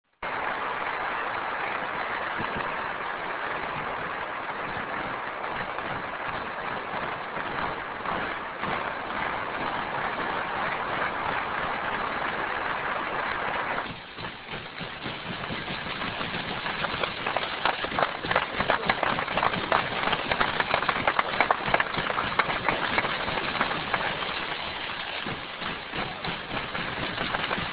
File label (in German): applaus